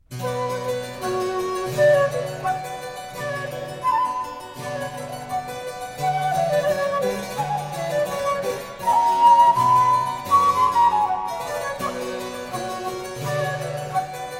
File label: Flute Sonata in C Minor Gayement